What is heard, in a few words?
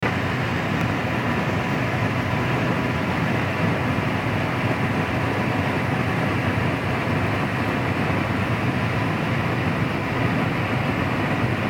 loud ventilation ambience noises